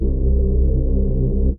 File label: Space Bass F, Wavetable; Instruments; Ableton; Synth Essentials